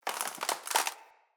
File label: Amb Dead Tree Texture; Ambience; Mendicant Area